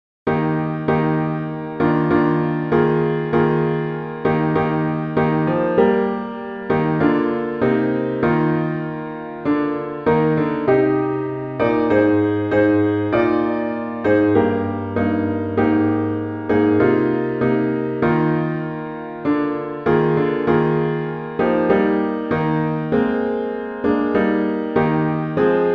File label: Piano all parts; psalm17